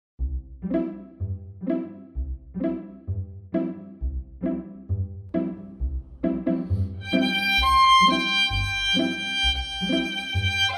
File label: C violin